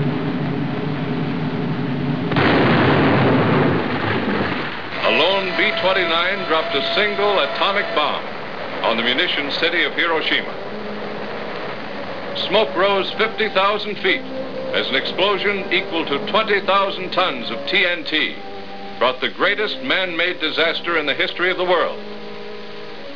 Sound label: bomb; japan